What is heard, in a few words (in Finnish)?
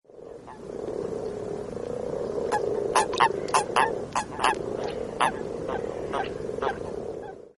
rupikonna